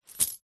purse pouch leather coins inside tilt coins fast movement; foley